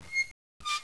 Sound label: bike squk; Mr. Wonder's Greatest Toyshop on Earth; OmniMedia